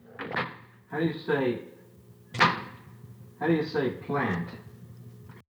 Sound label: single speed prod change